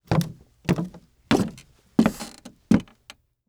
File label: Footsteps Wood Stairs; HauntedBloodlines